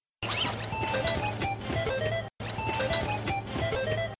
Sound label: Doublering; Gobusters